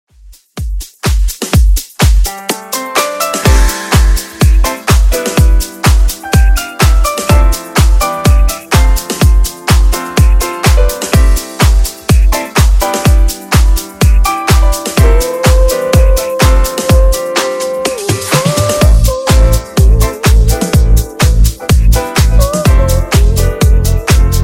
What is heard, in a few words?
Nudisko House Redrum 125Bpm